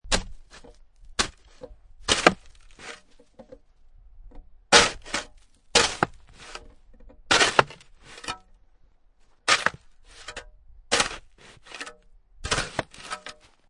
Shoveling Snow Or Dirt, Shovel snow, hard and grating sound; Can also be shoveling dirt, digging in ground